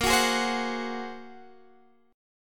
A sharp 7th Suspended 2nd Sharp 5th A sharp, Guitar Half Step Down